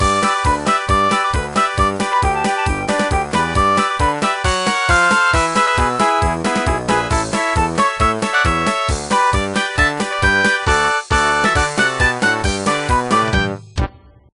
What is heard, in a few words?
Ending; Pass the Pigs Let the Good Swines Roll; NDS